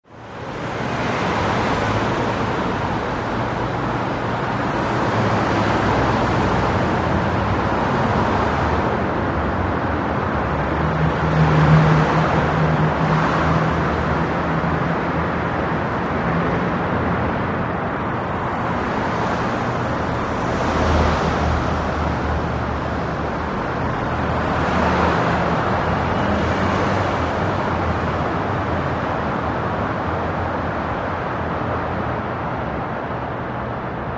Ambience Close Traf, Ambience: Medium City Traffic with Variou; Ambience: Close Traffic with Multiple Car Bys.